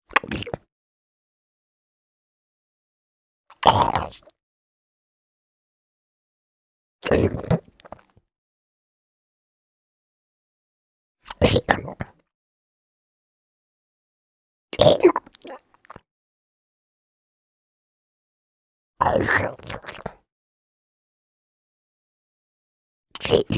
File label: ZOMBIE MALE EATING SWALLOW VARIOUS LENGTHS